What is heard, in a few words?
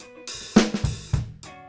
FILL